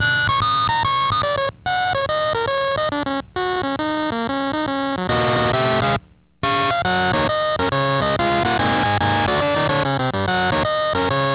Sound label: 4voice, pc